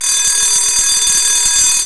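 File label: elevator bell; Bellen; elevbell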